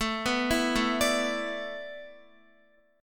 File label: A Suspended 2nd Suspended 4th, Guitar; Asus2sus4; arpeggiated